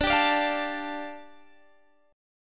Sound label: G 7th Suspended 2nd, D, guitar chord